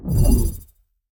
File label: Boost Pad On Release